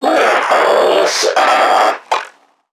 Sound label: NPC Creatures Vocalisations Infected; Five Nights At Akers; soma; npc construct